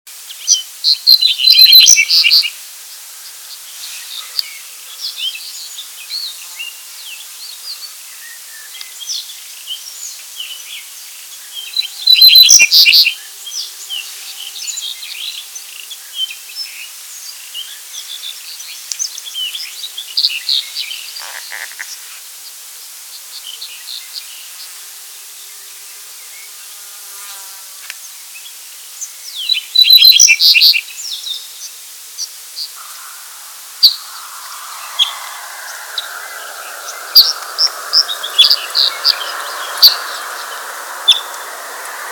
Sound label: The Cinereous Bunting